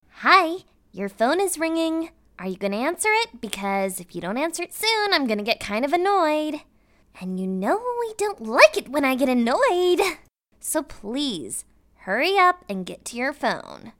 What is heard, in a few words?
Ringtone; Twilight